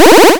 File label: Powerup